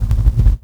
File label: bass, 150 BPM; Ueberschall; Drum & Bass Resonance